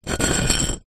household broken pieces ceramic dish edges scrape close